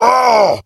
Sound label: Heavy Wound; battlerite; Glutton